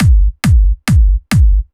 137BPM Kick 4_4; Drums & Perc; Amajor

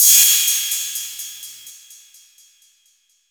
Crash, 126 BPM; Vengeance Samples; Vocal-Hook Kits; Song Kit

